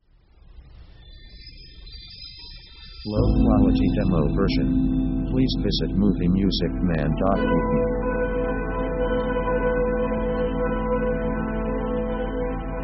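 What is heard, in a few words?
orchestral melo to major 70s; contemplative themes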